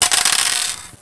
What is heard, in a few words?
DICE ROLL